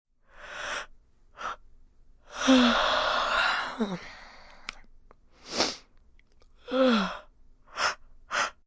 Yawn, female yawn, breathy, longer, sniffle, lip smack (human voice); Sniffling